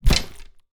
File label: spear attack